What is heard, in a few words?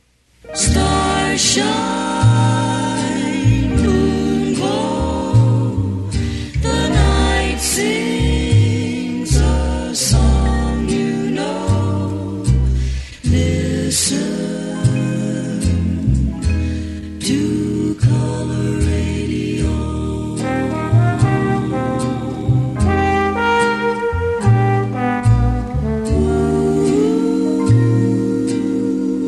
Jingle